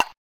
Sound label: Quest Timer Tock; Quests